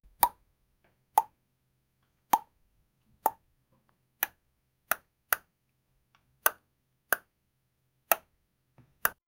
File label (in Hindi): bahut halki tin tin ki, toys